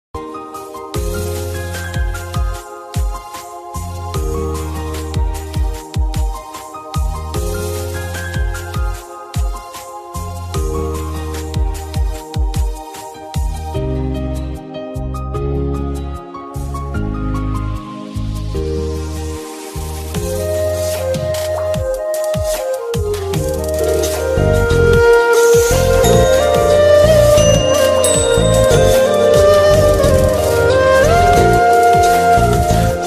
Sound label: Instrumental Ringtone